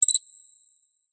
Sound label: squeaky metal Designed UI; PMSFX